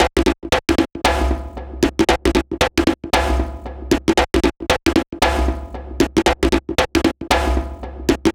115 Mundane, Breaks; loops; 115 BPM; Loopmasters; Jon Carter & Alex Blanco; Block Party Essentials

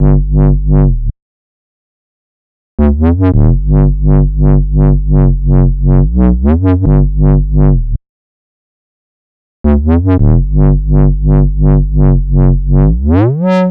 Bpm140 Raining Bass Loop Gmaj, Dubstep; Prime Loops; Dubstep Illusions

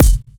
KICK, DRUM HITS

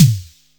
Toms

